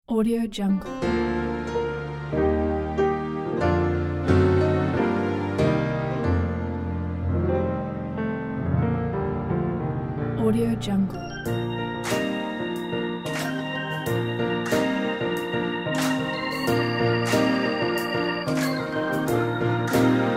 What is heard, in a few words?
joy to the world in gospel style